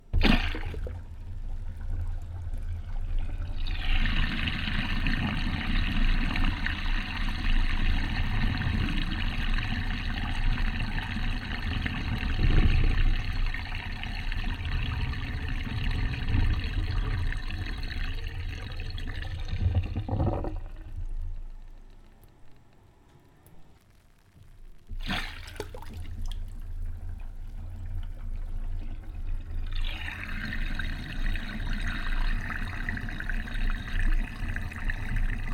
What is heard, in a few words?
dishwashing drain